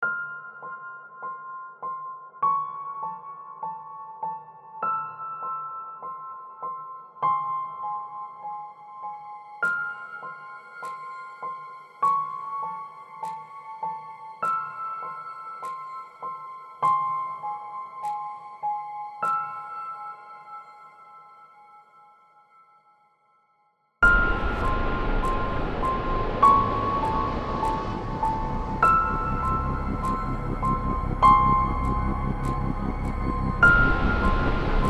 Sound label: Scary